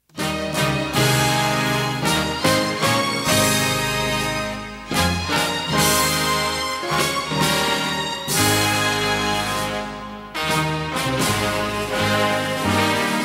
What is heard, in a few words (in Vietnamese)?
quoc ca hoa ky karaoke